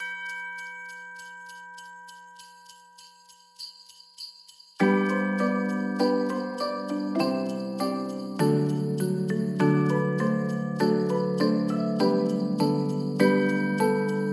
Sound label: christmas lullaby; mixkit